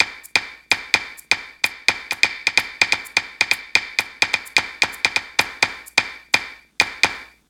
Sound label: latin patterns